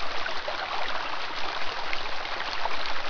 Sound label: water light; baseq2; hedge